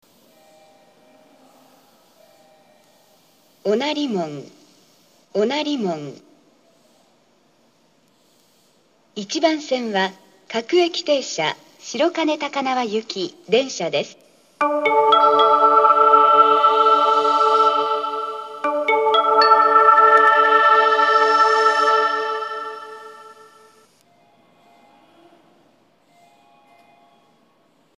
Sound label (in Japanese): onarimon 1 bansen, subway